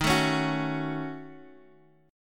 D sharp Minor 7th Flat 5th D sharp, down, Bass Standard; D#m7b5 strummed